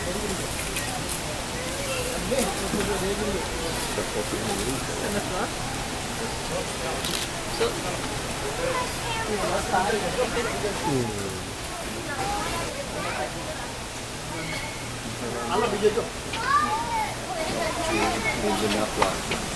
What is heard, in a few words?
Panda, San Diego Zoo